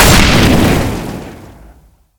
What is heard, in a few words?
inc grenade detonate; weapons; Counter Strike Model Pack; CS2